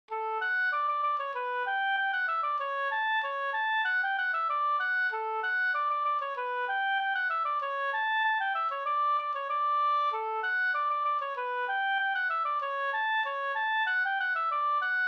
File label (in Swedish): polka polkett; e Axel Andersson Nykoping Flen146